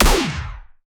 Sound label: single gunshot sound; shooter